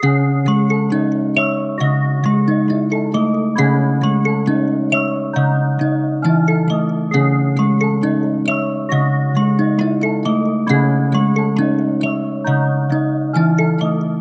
Dark Melody Loop - 135 BPM A# Min; Cymatics; Oracle Sample Pack

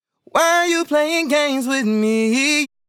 Sung Phrase Dry, 95 BPM D Min; Cymatics; Euphoria Vocal Sample Pack

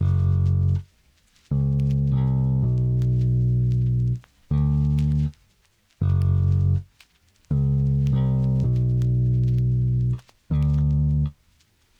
Classic Melody Loop - 80 BPM A Maj Bass; Melodics; Loops; Loop Stems; Cymatics; Eternity

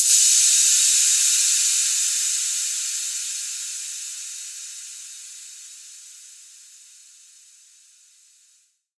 Noise Splash, Impacts

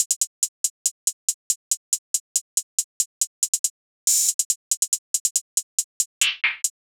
Drum Loop 140 BPM Hihat, Hip Hop; Drum Loops; Loop Stems; Cymatics; Carota

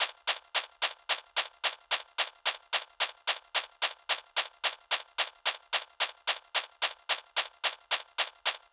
Nu Disco Kit 110bpm Shakers; EDM Drums; Drum Kits; Stems; Ghosthack; Ultimate EDM Drums; Nu Disco Kit 09

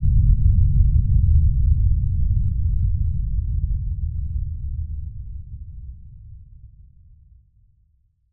Impact; Hits

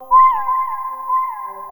SYNTH (C), Pedal One Shots; La Vida; Cymatics; ANALOG EVOLUTION Beta

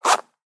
Zipper; Foley Percussions

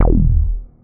Bass E, techno synth shot; Bass One-Shots; Ghosthack; Techno Synth Shots 2025

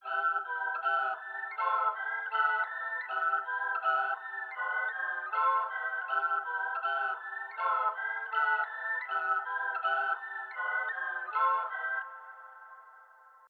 160 BPM E Min High Melody, melody loop stem; Trap; Cymatics; 2022 Melody Collection; Trap Pt 2; Rebel

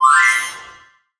Collectibles Classic FX; Cymatics; Video Game Sample Pack Vol 1

